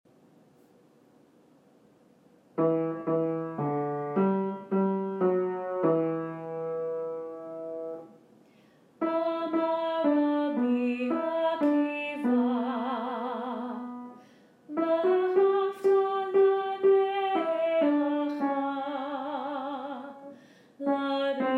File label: alto; Rabbi Akiva; Alto harmony